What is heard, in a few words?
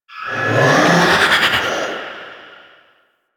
Wraith Reaper Vocal Turn; Warframe